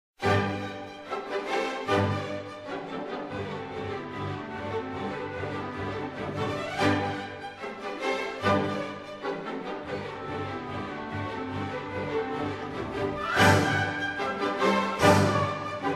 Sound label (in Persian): ترومپت